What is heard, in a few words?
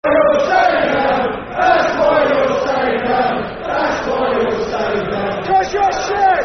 Football Chant (Soccer Song), Brighton; That's Why You're Staying Down; Brighton Bhafc